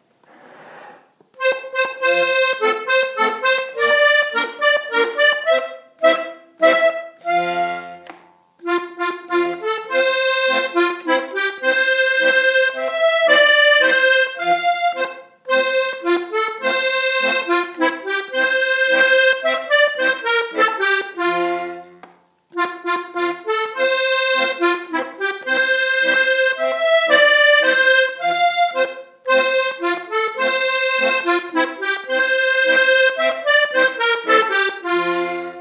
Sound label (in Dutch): landler